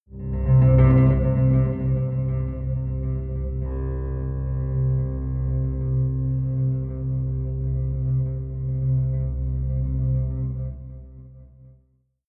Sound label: rattling piano drone, drones